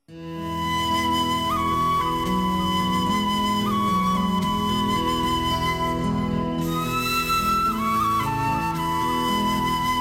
blessing flute, christian

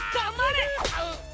slaps; Yukine; Yato

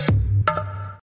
Renault SMS ring

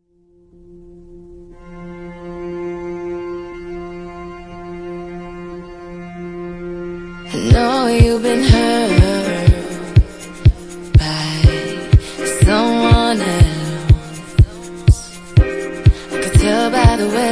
clap

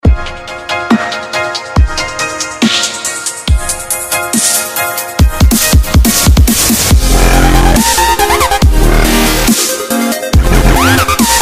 best dubstep

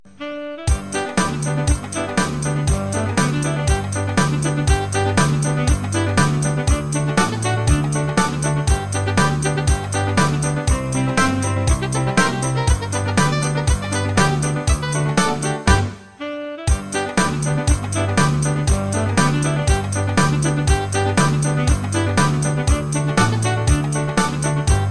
Funny Ringtone

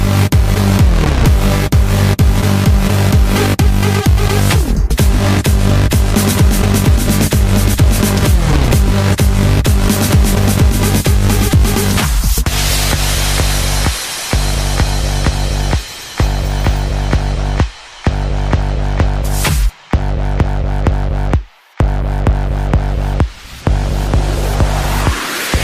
One tone alarm

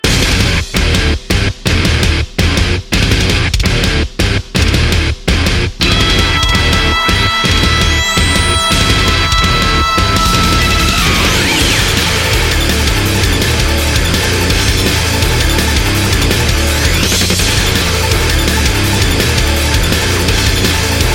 Riff

